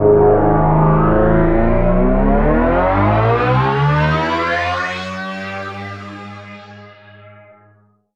FX Sweep Up; Sweeps Up; Vengeance; Essential Clubsounds Vol.3

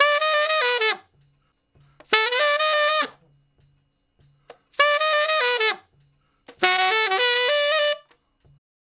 Ten Sax Straight (B), R&B; Solos

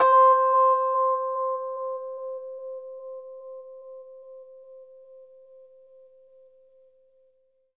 RHODES OCTAVE C; 3D Sound; 3D Keys VOL-1

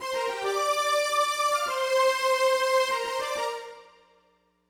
Smooth Groove Strings, 70s Analog Funk; Sonic Foundry (Sony Creative Software); What it Is!

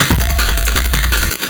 FX Beat, Drum N Bass

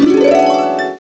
HARP UP; CMI Sound Library Rev 1.3

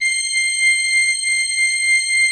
C6, Cathedral Organ; ILIO; Propeller Islands Cathedral Organ

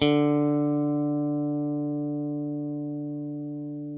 OPEN D, Guitar; Quantum Leap; 90's Sample CDs

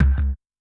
VOLTAGE, Kick, Yamaha DTXpress-II

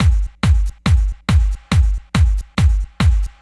Kick Loop, 140bpm

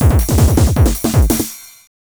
TH 909 160, Hard & Loud Techno; USB Soundscan vol.01; AKAI

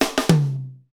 Hip Hop, Drumscapes Roland; Northstar

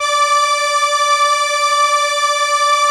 ARP D4, STRENS; Sonic Reality; Vintage Timetraveler

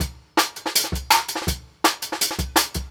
Synth, Jungle Breaks; 90s Sample CDs; Original Jungle Breaks

